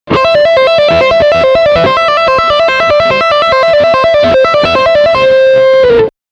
Gitarre lernen Legato

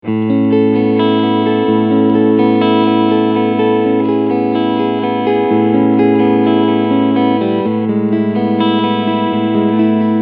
Clean Picking beide PUs

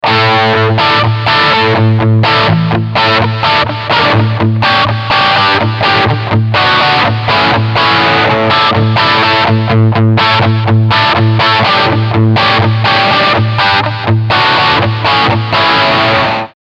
Riff Overdrive Steg PU

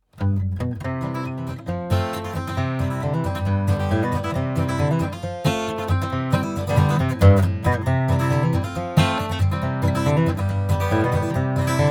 Gitarre 03 Meter

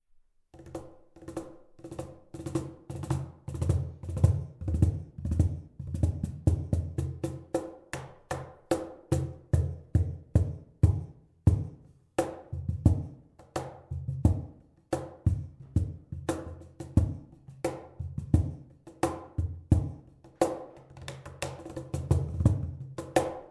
R 07 Cajon Raumaufnahme 1m Distanz

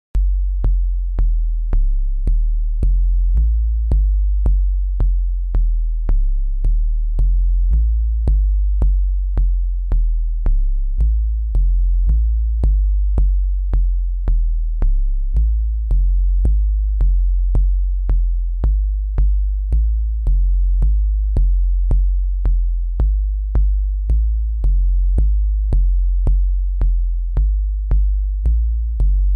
MFB-301 Pro BD als Bass

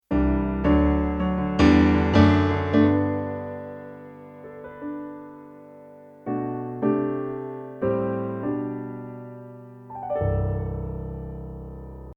SuperNATURAL Akustik Piano

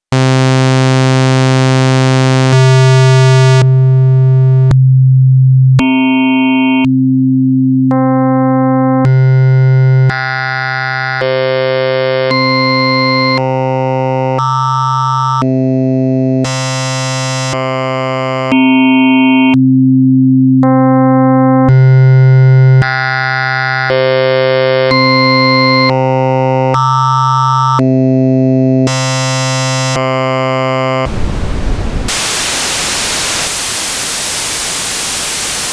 Oszillatoren Pro 2

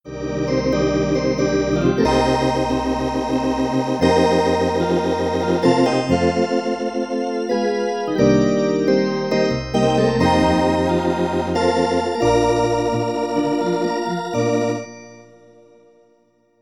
Orgel Midi Tasteninstrumente

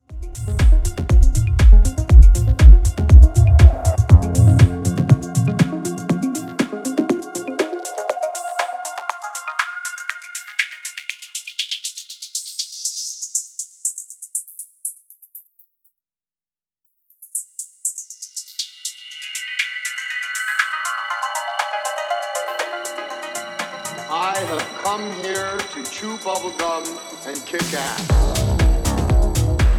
HP volle reso

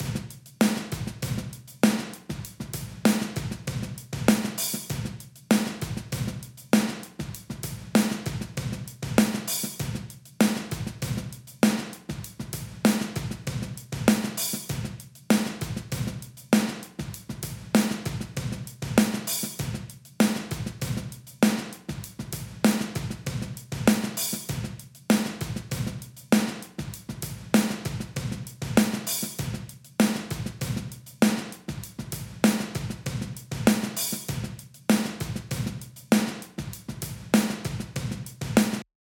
Channel Drums Kompressor Mix Modus; Flux Evo

